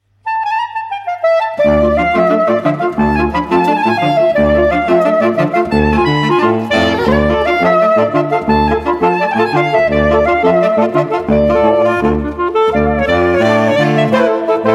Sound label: Klezmer live opname; compilatie